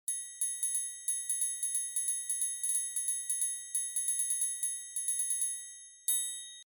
Triangle

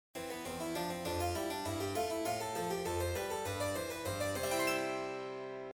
Harpsichord

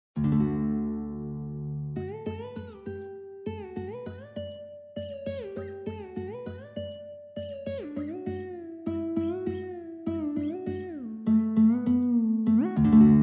Sitar

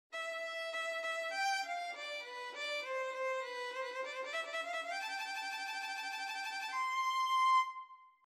Violin

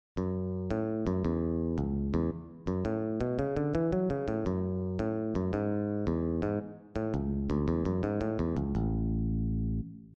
Bass Guitar

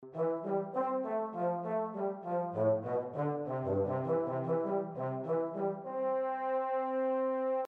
Euphonium

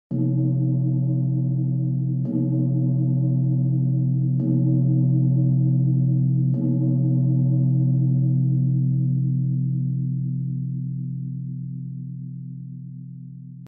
Gong

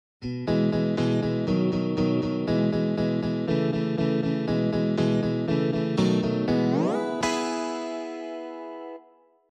Electric Guitar

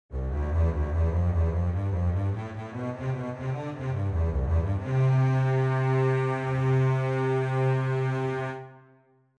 String Bass

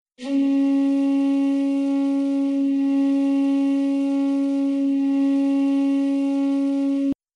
Japanese Bamboo Flute

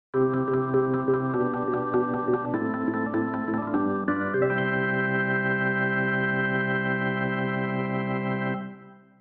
Electric Organ

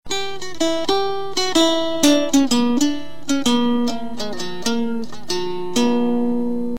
Balalaika

